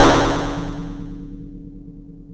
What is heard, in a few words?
Cymbal, Nord Modular Drum Samples; Yawgmoth